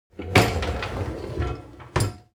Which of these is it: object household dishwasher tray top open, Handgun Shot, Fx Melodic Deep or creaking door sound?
object household dishwasher tray top open